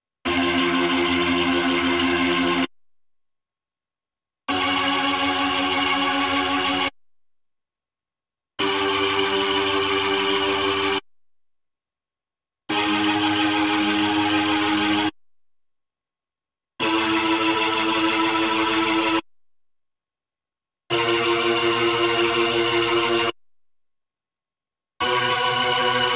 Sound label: B3 Organ; Masterbits CLIMAX 8